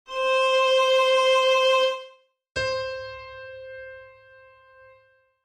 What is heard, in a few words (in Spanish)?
Timbre